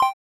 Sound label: Battle select; Syrup 2 Candy Alchemy RPG